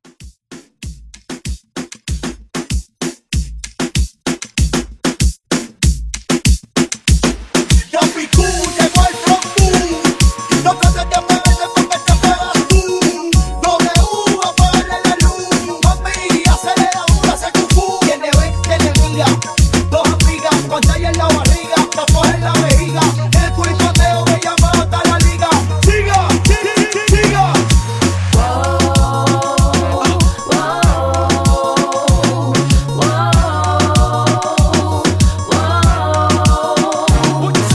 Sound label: Reggaeton Hype Re Drum 96